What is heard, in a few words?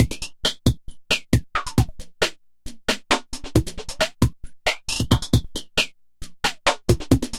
619 GRVD, Boom da Drumz, Grooved; E-MU Producer Series Vol. 6 – Analogia Project